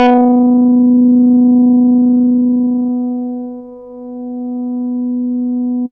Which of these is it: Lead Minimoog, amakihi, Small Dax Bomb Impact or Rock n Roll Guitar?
Lead Minimoog